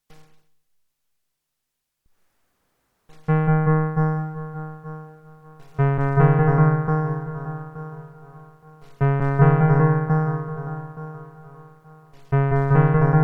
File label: Boss DM 101 Synth Pattern